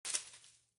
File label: Bush Rustle